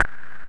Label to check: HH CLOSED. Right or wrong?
Right